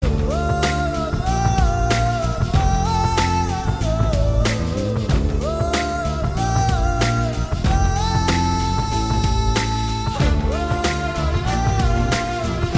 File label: Voice Tune